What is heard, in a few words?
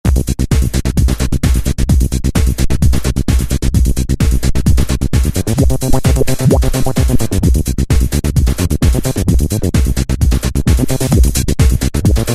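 Acidic Groove; Dance; Roland SR-JV80-06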